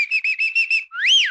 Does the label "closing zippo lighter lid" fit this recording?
No